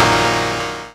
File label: Synth Shots; Vengeance Samples